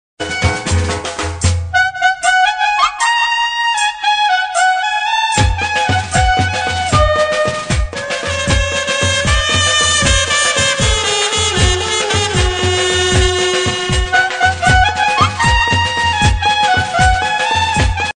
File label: brass band ringtone; Ekvira Aai